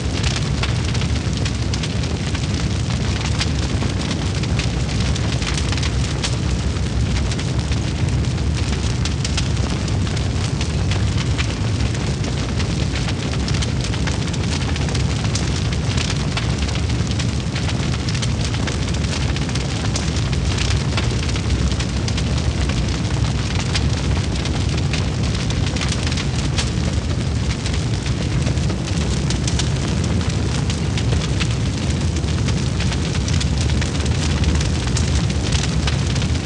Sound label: Rain - Large Bonfire